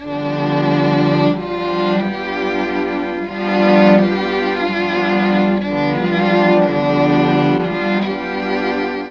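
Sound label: bass strings, Mellotron; Mike Pinder - Mellotron Archive CD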